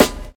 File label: Damped Snare D Key; snare drum samples